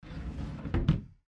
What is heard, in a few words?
wardrobe